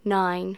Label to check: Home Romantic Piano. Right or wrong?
Wrong